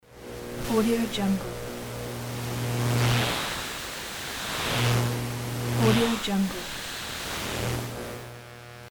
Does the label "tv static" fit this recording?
Yes